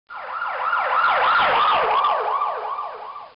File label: ambulance